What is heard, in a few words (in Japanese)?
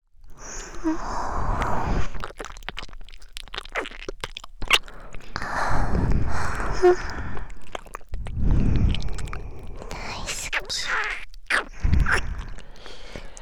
耳舐め (両耳同時)